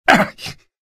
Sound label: monk pain; Source Engine; video game; ravenholm